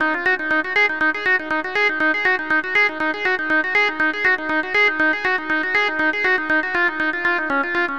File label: TRANCE